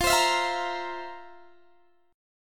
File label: F Major 7th Suspended 4th, Guitar, DADGAD